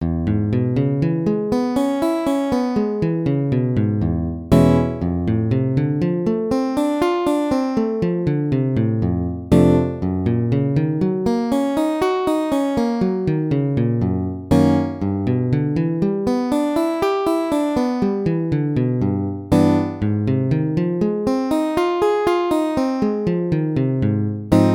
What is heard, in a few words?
6chord arpeggio daily; gipsy jazz